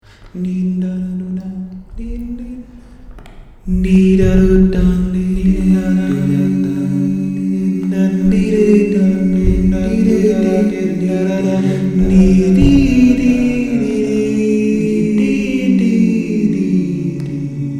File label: improvisation